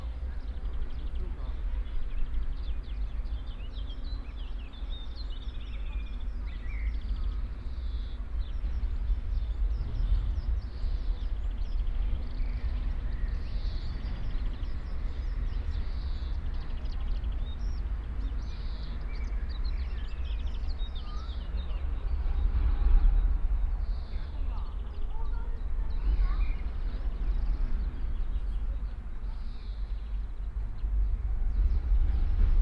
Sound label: S-bahn